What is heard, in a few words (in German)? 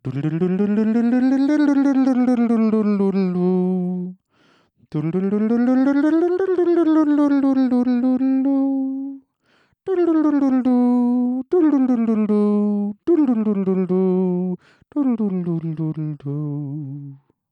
Voice Schnell